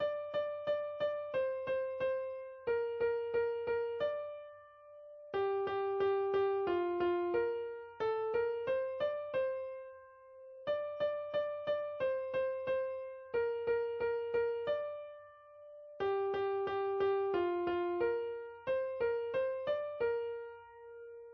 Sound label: Jolly Old Saint Nicholas